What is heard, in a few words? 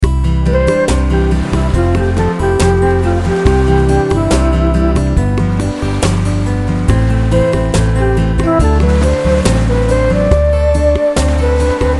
BG Sound; fishing3